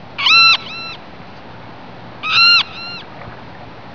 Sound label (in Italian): gabbiano